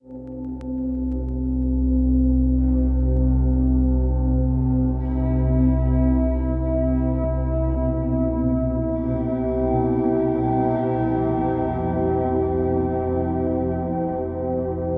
Crystal Sound Bath 9.6 Hz Alpha Waves

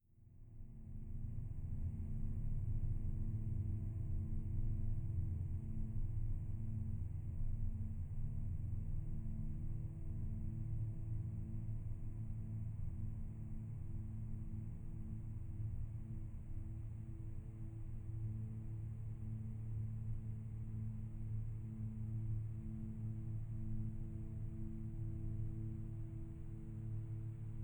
Relaxing Spa Music

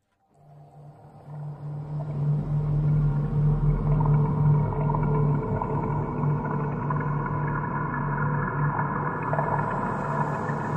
Diablo Style Hellscape Dungeon Ambience

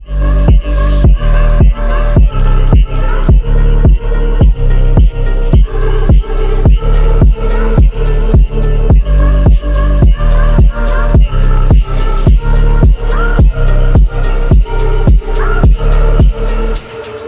night phonk

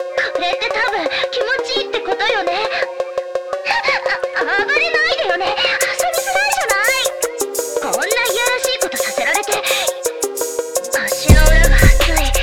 Phonk

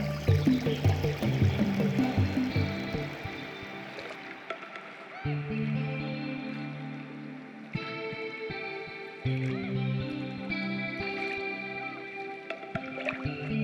Rain Sounds

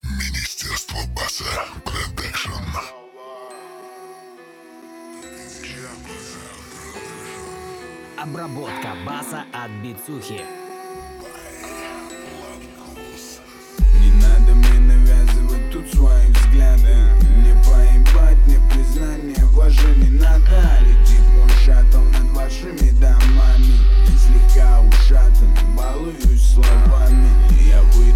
Bass, Low Bass; Zvukovoe Davlenie 419

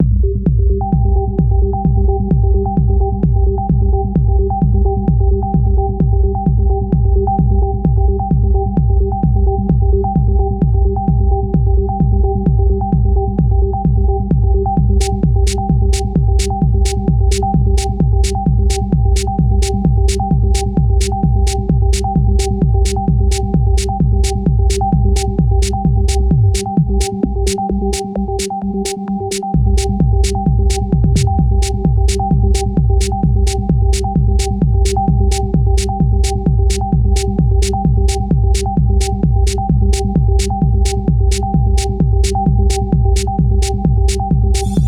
Bells

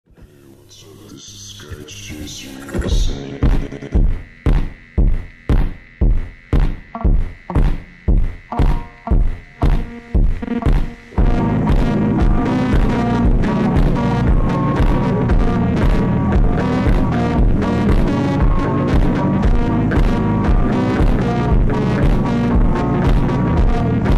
Slowed reverb; 104.5 Sky FM

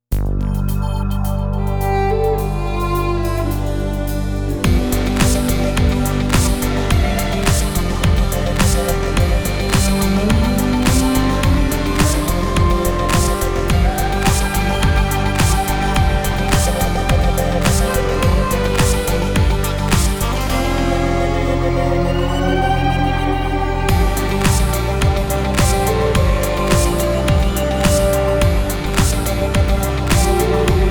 Groove And Grace

